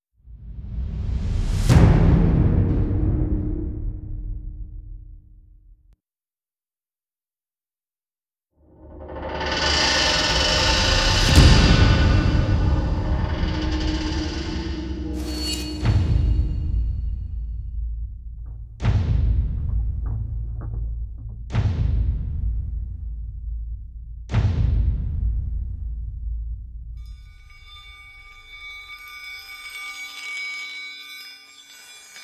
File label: drums percussion mix; full length